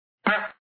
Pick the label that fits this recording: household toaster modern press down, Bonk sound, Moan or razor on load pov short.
Bonk sound